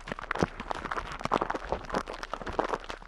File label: mobs stonemonster; mobs redo